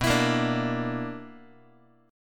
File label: B Minor Major 11th, BmM11 chord, Guitar; strummed; JGuitar